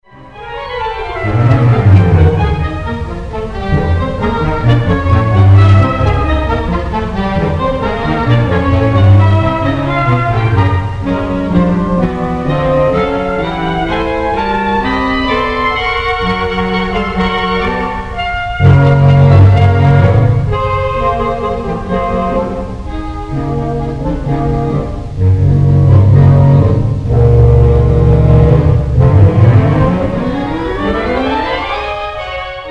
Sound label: Outdoor Music